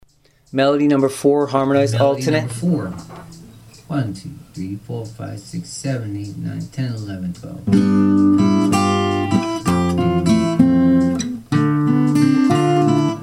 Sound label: melody alternate